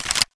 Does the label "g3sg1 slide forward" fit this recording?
Yes